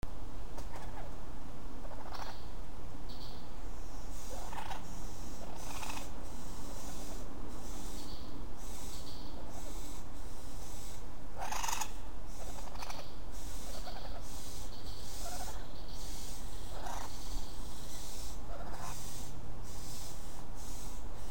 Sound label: nest